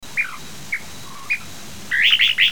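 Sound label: Kentish plover; sirotidori; toritori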